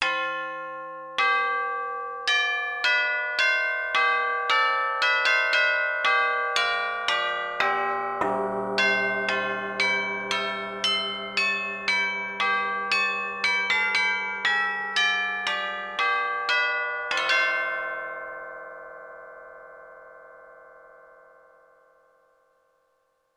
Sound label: Tub-Bell, Yamaha TX16W